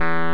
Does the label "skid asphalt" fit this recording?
No